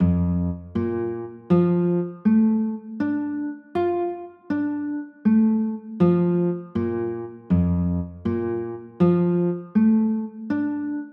b flat maj7

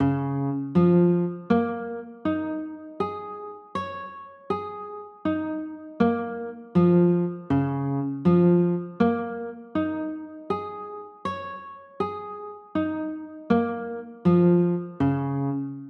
c sharp 6 9, chords

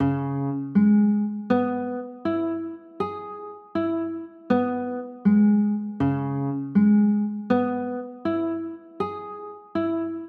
c sharp m7

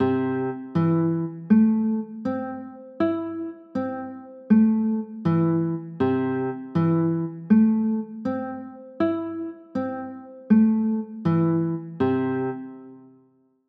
c 6, chords

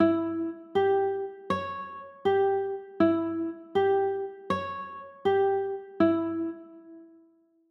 a 6, chords

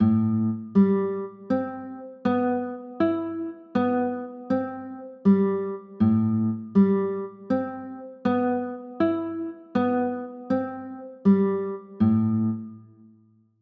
a m9, chords

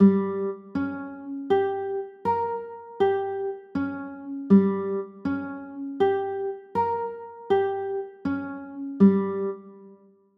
g dim, chords

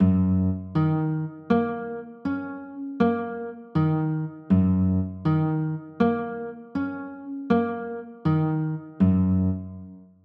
f sharp 9; f sharp 6

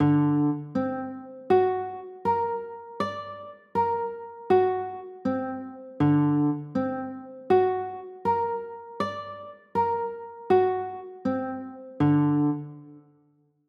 d 7 sharp 9, chords

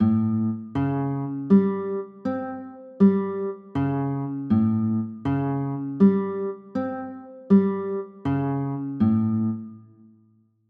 a sharp 6 9, chords

